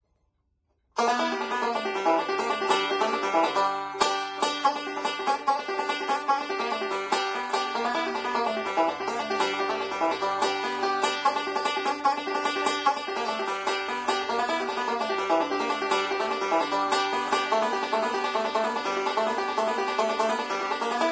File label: Banjo; Zomboid; Lifestyle